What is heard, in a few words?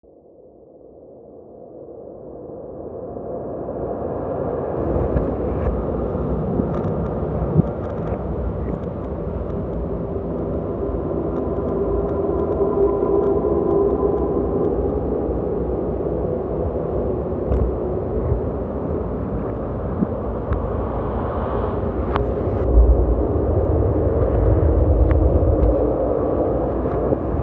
Wasteland, Ambient; SFX; Ambient - General